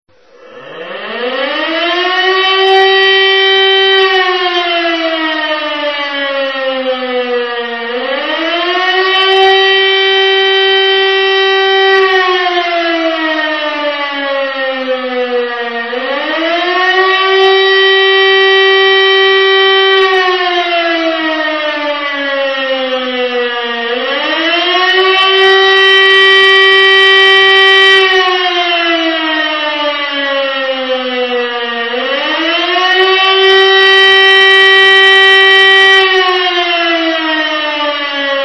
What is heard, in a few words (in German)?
alarm, Sirenenalarme; Sirenensignale; Sirenenalarm - Signale und deren Bedeutung